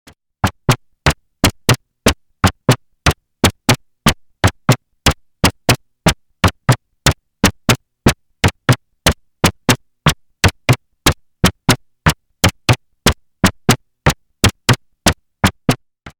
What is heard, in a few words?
MS-20 Patches Space Bongo Drum